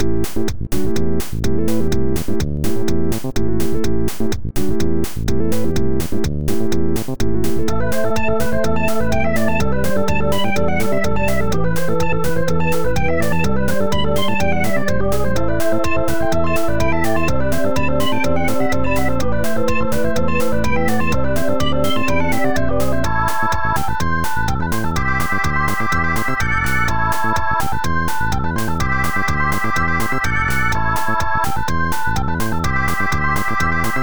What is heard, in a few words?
Chill